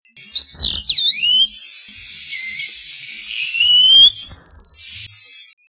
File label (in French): tichodrome